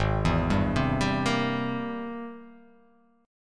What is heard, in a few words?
G sharp Augmented Major 9th G sharp; G#+M9 arpeggiated; JGuitar